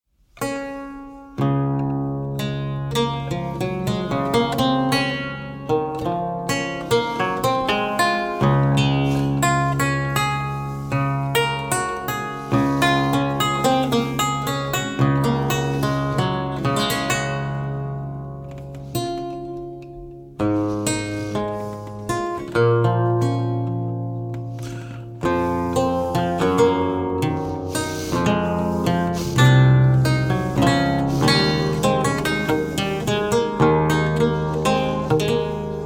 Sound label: Prelude